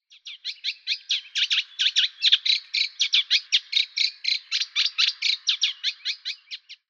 Teichrohrsaenger Acrocephalus scirpaceus; Vogelstimmen

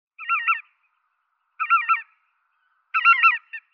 Zwerggans Anser erythropus; Vogelstimmen

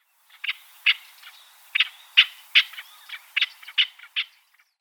Felsenhuhn Alectoris barbara; Vogelstimmen

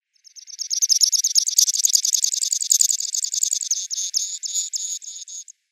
Petschorapieper Anthus gustavi

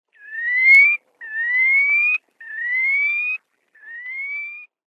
Sichelstrandlaeufer Calidris ferruginea; Vogelstimmen

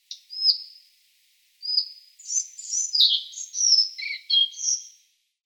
Halsbandschnaepper Ficedula albicollis; Vogelstimmen

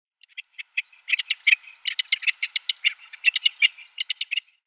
Steinhuhn Alectoris graeca; Vogelstimmen

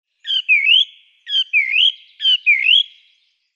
Singdrossel Turdus philomelos; Vogelstimmen

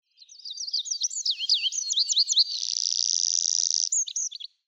Waldpieper Anthus hodgsoni; Vogelstimmen

